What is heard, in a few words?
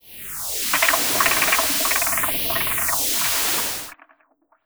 Industrial Noise